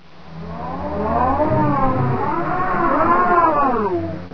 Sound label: pit drone die